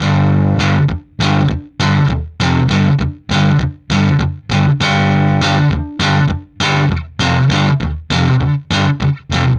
Low Down Guitar; Guitars

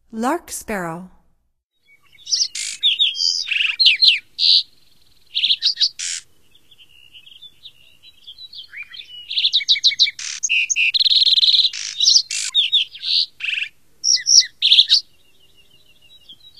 Lark Sparrow, Songs of Birds of North America; Stokes

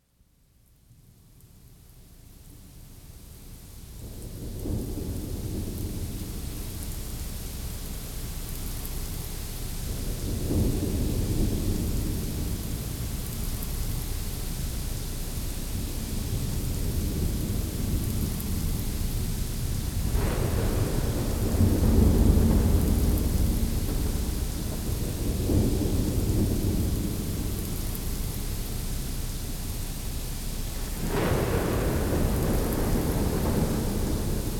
Gentle Droplets, Dazzling Thunderstorm; The Sounds Of Nature

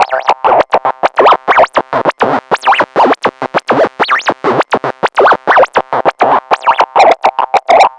PROTOTECHNO

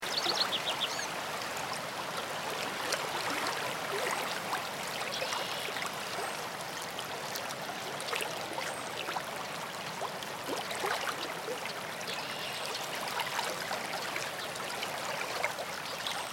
Streamside Songbirds; Nature Sounds; Sounds of Nature Collection

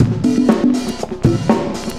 cooling 120, loops, 120bpm

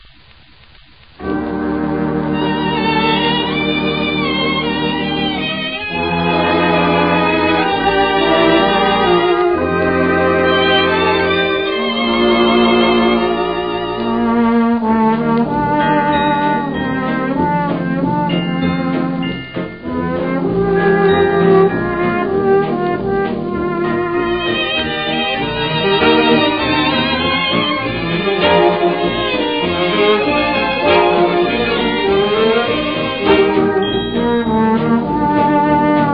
Medley Orchestra

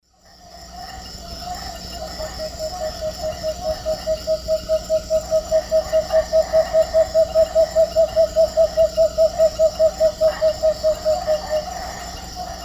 Megascops watsonii, song; S. America; Eastern Lowlands

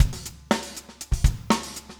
funk 120 bpm, loops

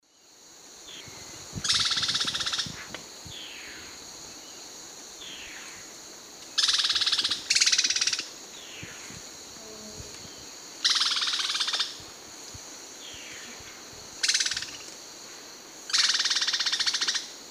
Myrmeciza fortis, alarm calls; S. America; Eastern Lowlands

